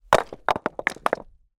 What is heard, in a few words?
impact rock large rockpool dry